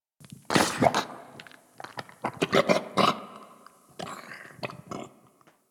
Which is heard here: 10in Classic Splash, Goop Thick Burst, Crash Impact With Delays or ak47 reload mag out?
Goop Thick Burst